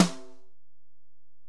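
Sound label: Acoustic Snare, Alesis DM-PRO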